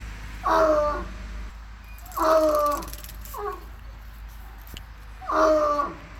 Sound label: Bloodhound barking